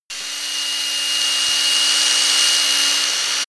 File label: drill classic long; maschinen; bohrer; soundpool